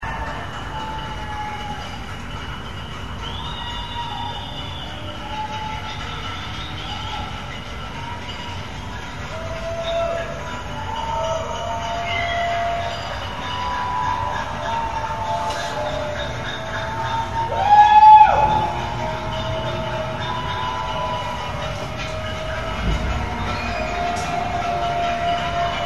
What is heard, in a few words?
Whoop; UWS